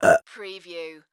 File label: Burp Female; sound-effects-bible